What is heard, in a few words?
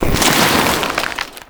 Debris Fabric; Warframe; Entrati; Ambience